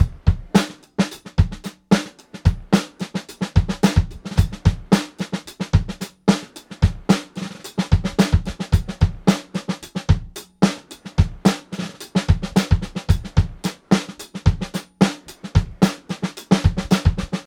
110 bpm drum groove e key, Free drum groove - kick tuned to the E note. Loudest frequency: 739Hz